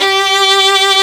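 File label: STR VLN, violin; AMG - Now CD-ROM (Roland); Art of Samplng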